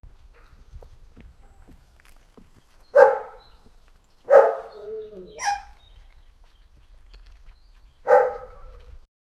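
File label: foot step with dog barking, outdoor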